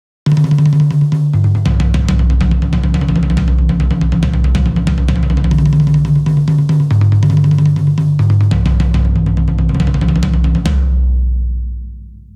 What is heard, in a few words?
tom